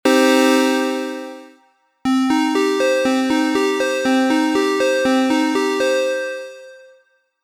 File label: Arp